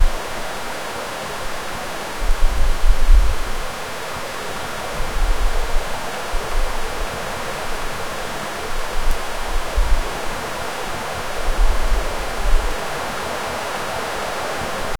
hot spring ambience

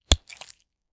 foley sound, fruits

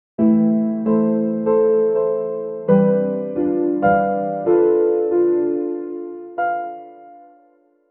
uplifting calm background, educational

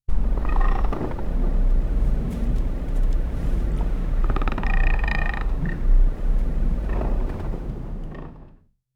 realistic cinematic sound, ships

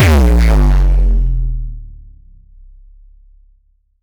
mentalcore kick, beat

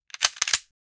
reload shotgun

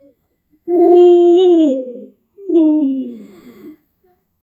bees crawling muffled

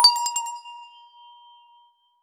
single strike on a, glass harp

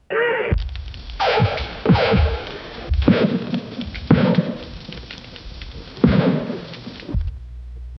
smoky hallway continuous, coughing

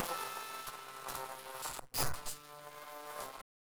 incorrect sound, error

